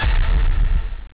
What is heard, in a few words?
oomph